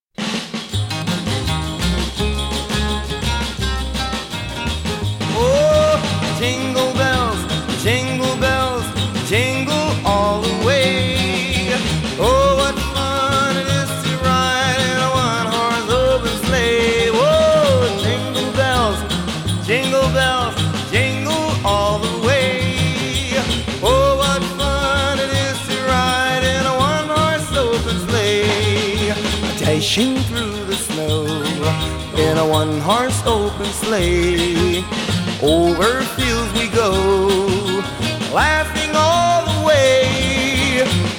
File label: Jingle Bells